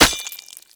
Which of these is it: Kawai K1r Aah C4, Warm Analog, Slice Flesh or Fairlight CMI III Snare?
Slice Flesh